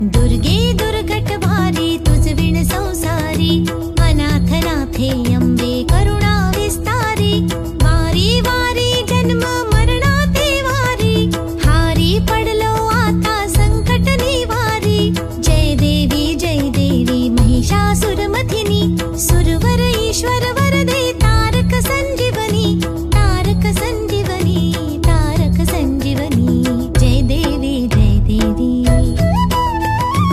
Marathi Devotional

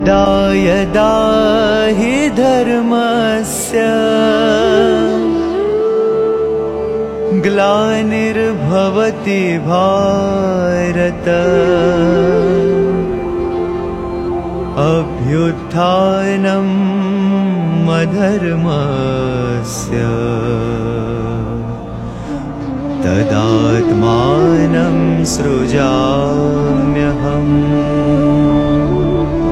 Bhagwad Geeta Shloka

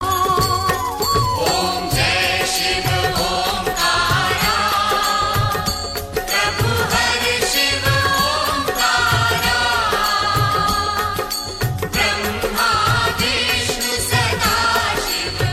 om jai shiv omkara

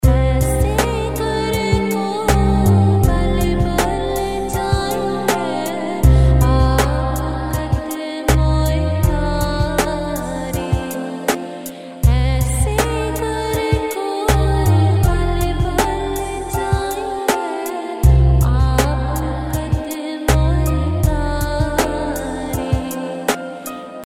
Sikh Ringtone

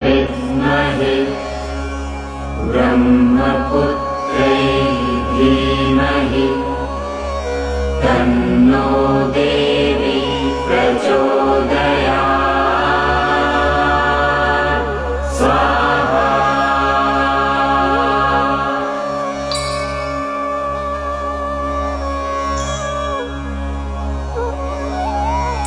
Saraswati Gayatri

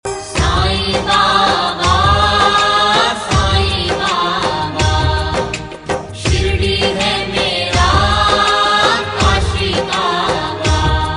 msg tone, ringtone; saibaba

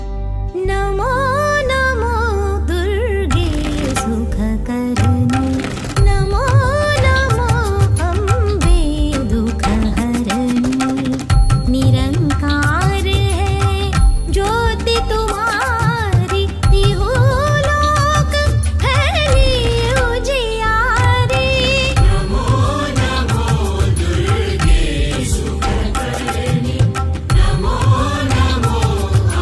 Durga Chalisa, Devotional

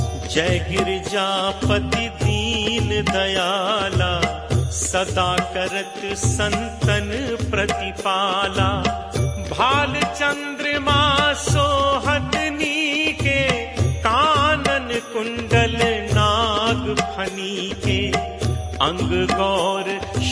Shree Shiv Chalisa

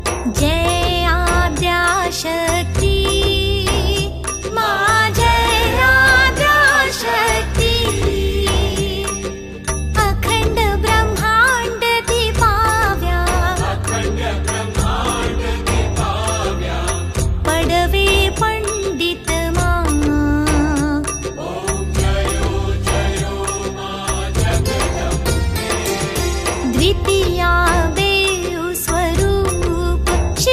Gujarati Aarti

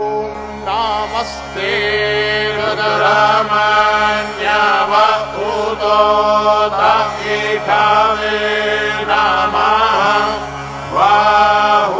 Shri Hanuman Gayatri

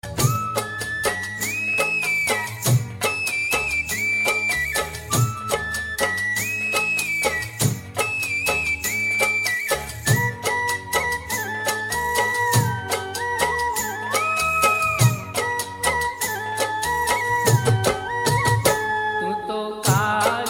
navratri dj music

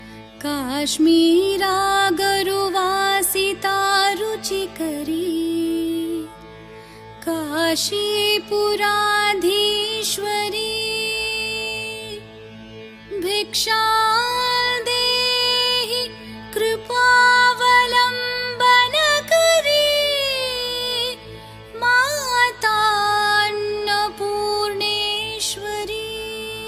Annapoorna Stotram, Devotional Chant

